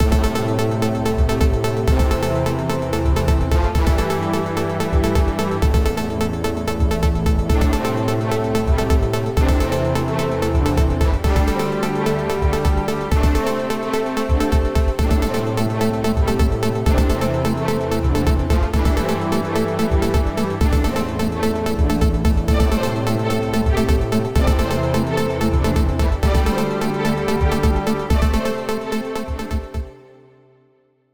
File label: news alert